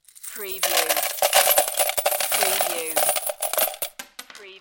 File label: Slot Machine Win - Coins Falling Into Payout Slot